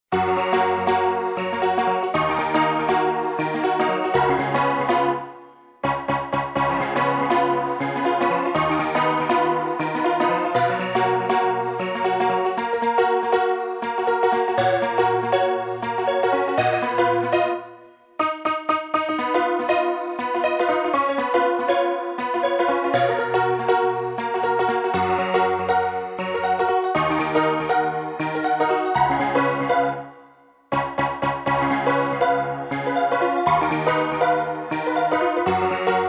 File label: rag piano